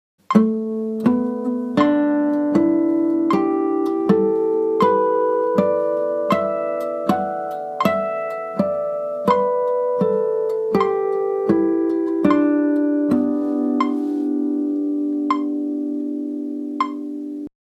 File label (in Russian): Naturalnye flazholety; uprazhneniya